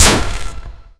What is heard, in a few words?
energy weapons